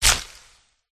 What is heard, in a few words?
attack contact up